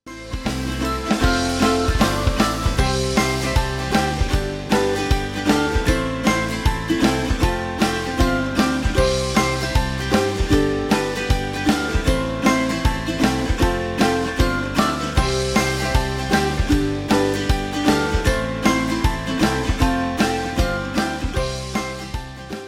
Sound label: music